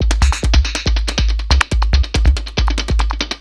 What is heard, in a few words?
Beats, Industrial